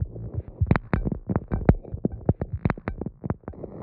125 Complex, Percussion; Loops; Percussion (Mono); Touch Loops; ElementsOne; AbstractRhythms

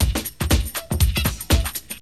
SHUFF A 120, loop, BPM; 115-121 BPM

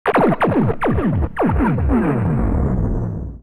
SPACE FX 140; SpaceSounds

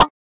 STR Met, Strings; Instruments; Legacy

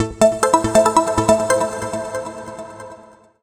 TECHNO EXTRA 140

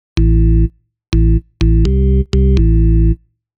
DEEPHOUSE BASS 125 A

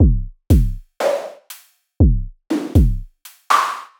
Synthdrum

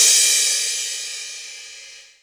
TECHNO FX 140

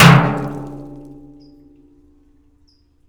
hard hit, rust kit; dry; Nucleus Soundlab; Ambient Drums

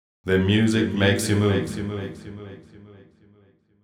deep house male, 125, A, vocals

